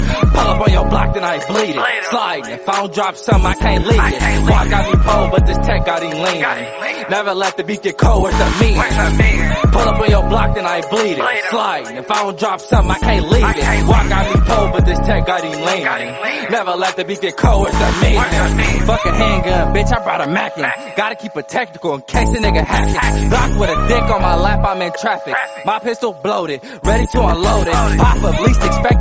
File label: english song tone ringtone